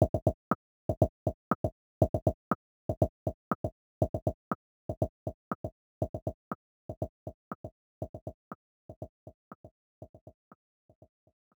Dance - Lifeform (Solo); Spore Creatures; Nintendo DS

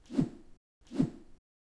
Screamer Idle

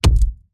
Mech Footstep Base

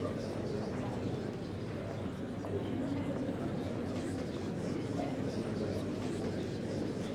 Crowd Murmur Loop; gamerip

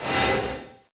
Heal; Folk Hero

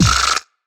Twist It; SFX TwistIt Remaster

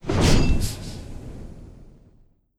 Roasterflameend

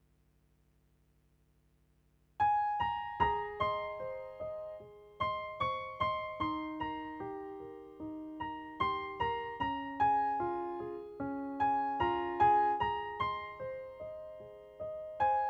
Bayer No. 50 Extented Piano; Key Techno Complete Edition

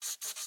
Sketch Env Write; Maze

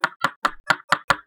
Hammering

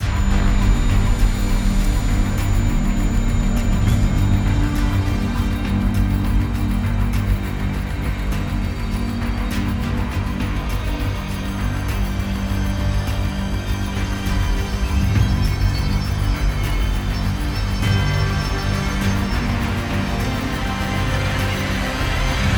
Battle; Black Beacon (Mobile) (gamerip) (2025)

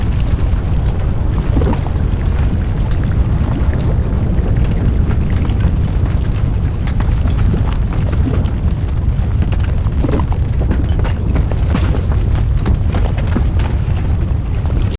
Amb Lava pool; Fur Fighters

